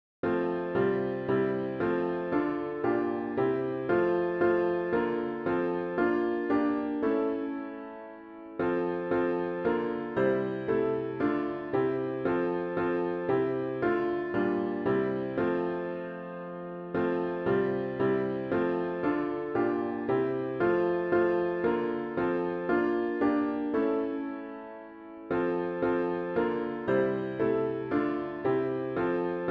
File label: piano only; Lord Who throughout These Forty Days